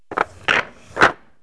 rack, 9ball

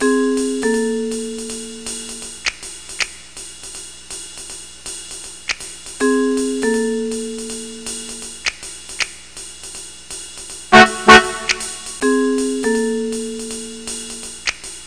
HEPJAZZ; Hell Cab

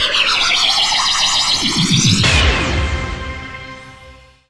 pling; Ballistics

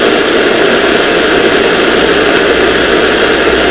static

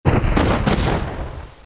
Impact; fallen

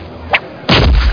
slop; doom

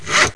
msaw

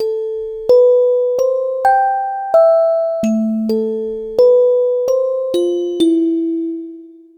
Synt; Evolution 081

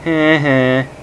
heh-heh; Sound Library 2000; Wayzata Technology

